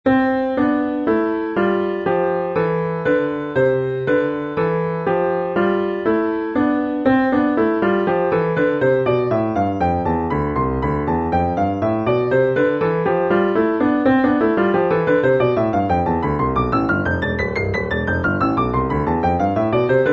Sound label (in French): Gamme de do majeur mvt contraires